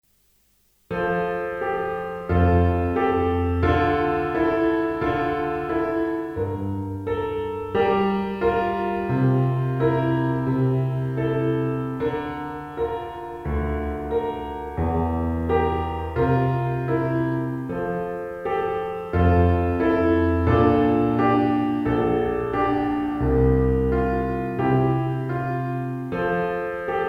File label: piano pop